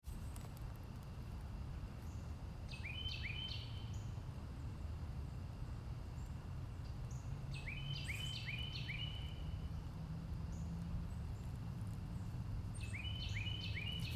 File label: carolina wren